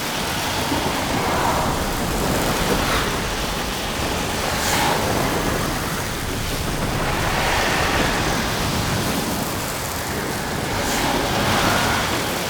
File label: Hazard Fracture Loop Electric; Warframe; Duviri; Undercroft Hazards